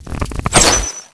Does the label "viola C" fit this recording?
No